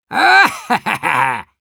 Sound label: Demoman laugh short, ru